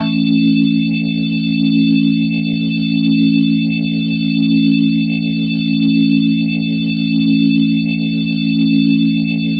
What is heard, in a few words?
Glowing Organ A Maj; Major; The Vitale Organ